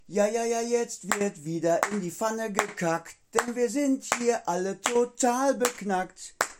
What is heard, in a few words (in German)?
Pfannenklatsch